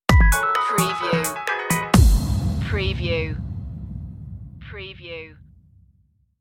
pump steady groove stinger

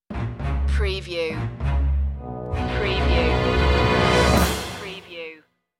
sting no choir

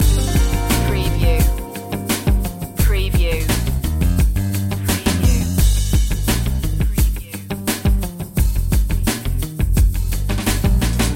lounge living loop

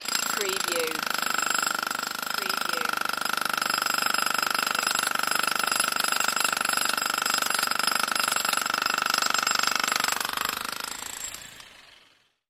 pneumatic hammer

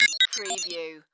Data Musical Readout; sound-effects-bible

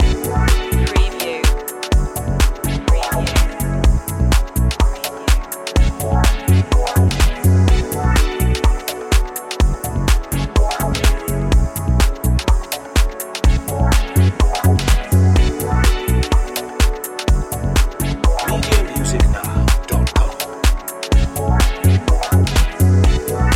lounge loop

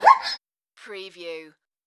creature hurt

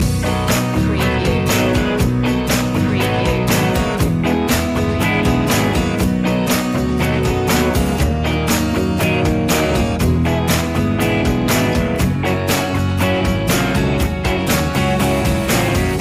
joyful ride loop

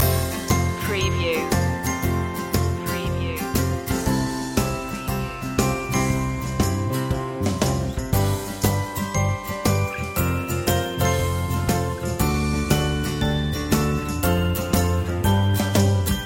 closer loop

